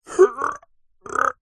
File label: human male man strain grunt